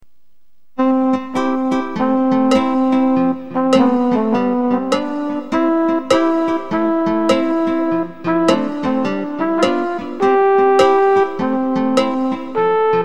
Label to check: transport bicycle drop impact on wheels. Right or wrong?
Wrong